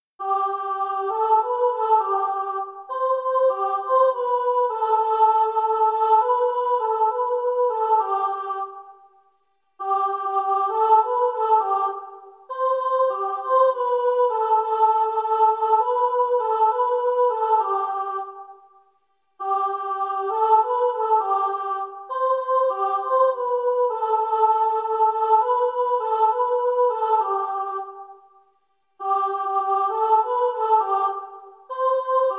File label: CHOIR sop; Shosholoza